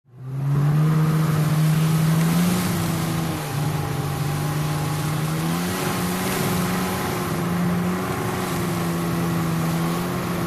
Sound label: Jet Ski Onboard Stead